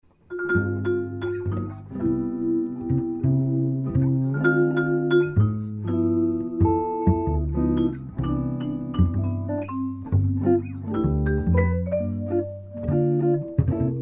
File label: Trio